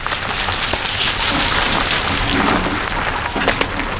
roll garbage, terrains; Tony Hawk's Project 8; XBOX

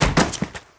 bodyfall stone, foley

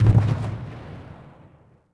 distant explo

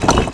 bouncy tombstone hit; bouncy objects; Tony Hawk's Project 8; XBOX

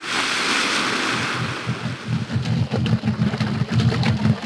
Engine; Splashdown; XBOX

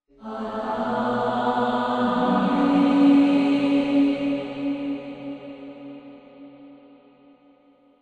puzzle success; music; The Da Vinci Code; XBOX

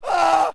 pre fall, Rider

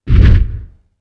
punch threat; UFC Tapout

